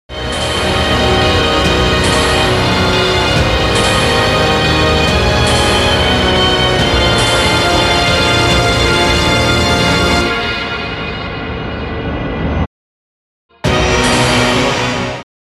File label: fictional trailer